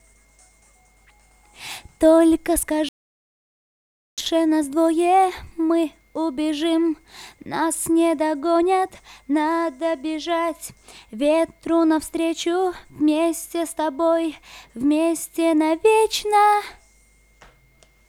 VOX Couplet, acapellas

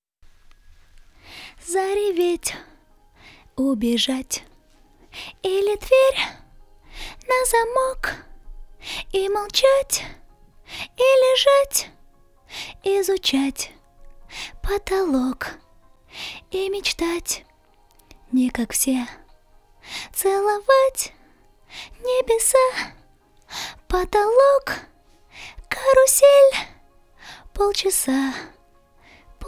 Verse, acapellas

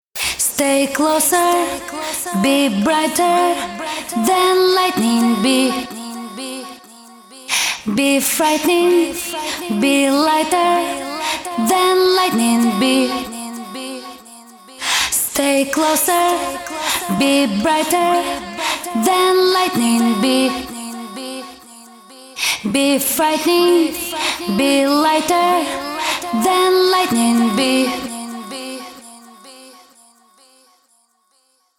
Acapella

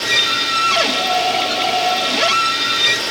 DEEP, 90bpm; Demo Multitracks

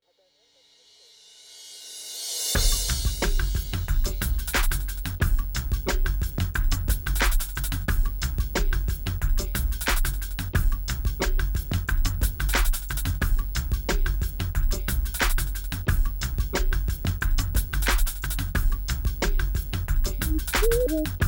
Beat

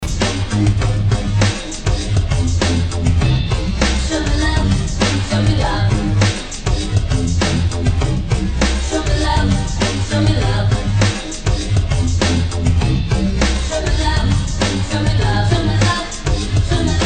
chorus

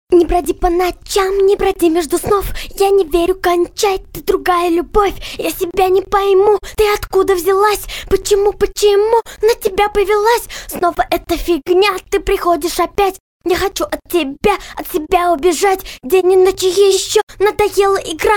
Acapella

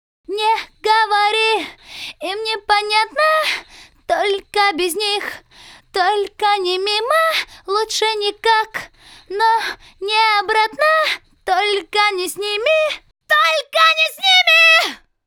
VOX Couplet, acapellas

